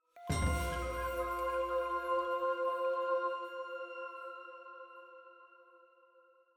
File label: item Jingle Full Choir Flute F